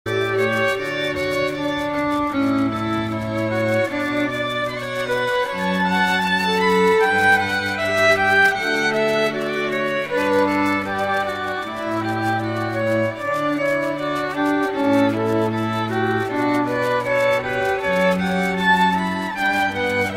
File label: Canon string trio